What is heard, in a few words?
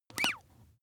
bath duck release